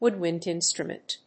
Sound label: woodwind instrument